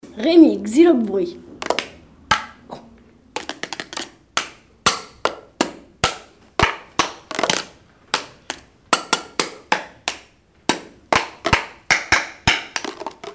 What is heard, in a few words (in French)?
xylobruit